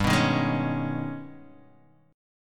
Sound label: Ab Minor Major 11th